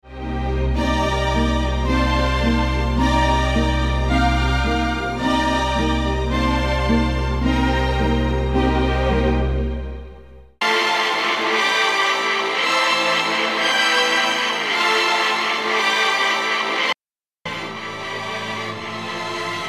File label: Reversed strings